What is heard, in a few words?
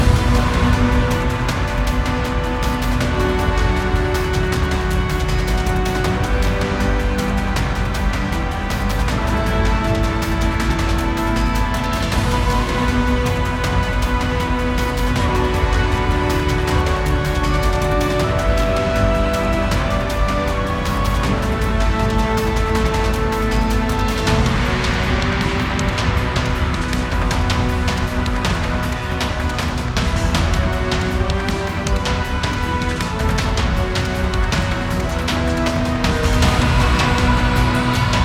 Battle